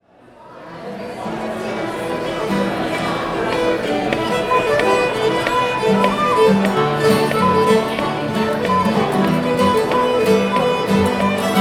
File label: Cooleys Reel